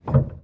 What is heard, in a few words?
piano